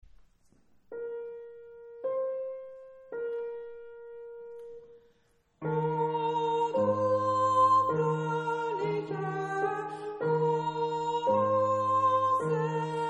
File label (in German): Oh du fröhliche sopran; Weihnachtsprojekt 2024